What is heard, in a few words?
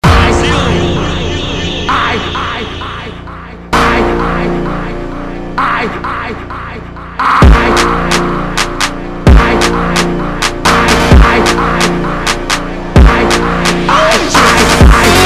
Brazilian Phonk